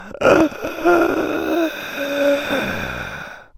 Human Dying Breath; little robot sound factory

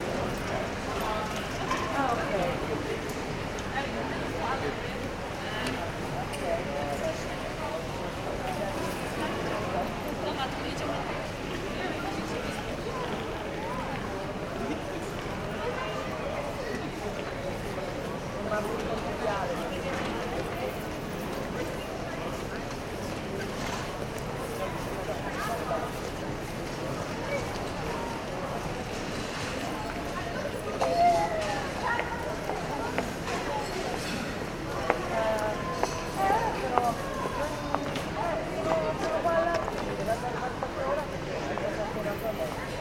ambience airport arrivals hall; stansted; sound effects one

